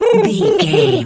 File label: pigeon call sequence